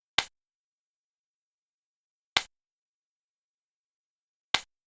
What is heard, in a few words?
gavel touch